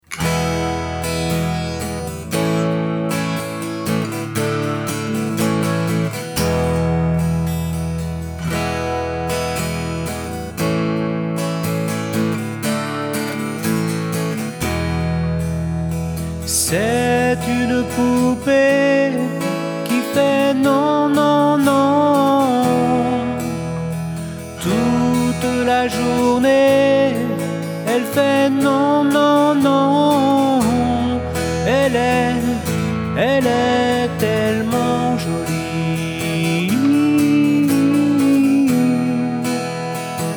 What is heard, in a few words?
Jingle